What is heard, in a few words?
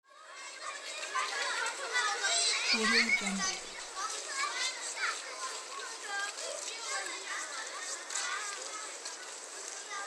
crowd of children